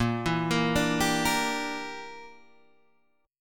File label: A sharp 6th Flat 5th; A#6b5 Chord {6 7 8 7 8 6} arpeggiated